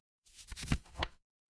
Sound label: paper fabric page turn